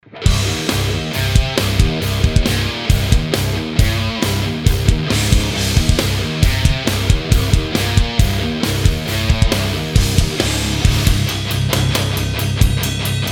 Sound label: preamp test peavey